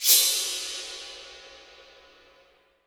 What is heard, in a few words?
IMP CYMBAL, Cinematic Impact